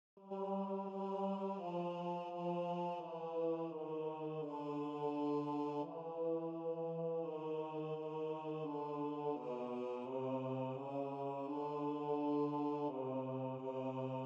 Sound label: choir